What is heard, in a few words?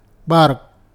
barrk